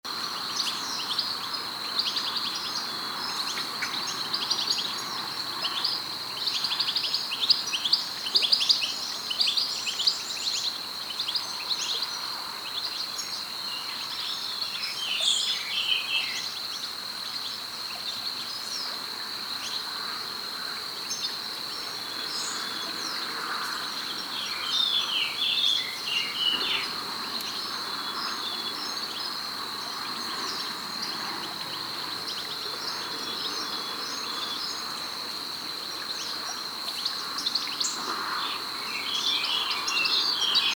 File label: Carduelis carduelis; SETE